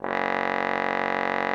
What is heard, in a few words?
TROMBONE F#1; Xsample VOL-3